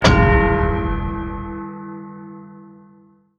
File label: bell